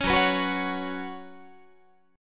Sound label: Gb Suspended 4th Gb, down, Guitar; strummed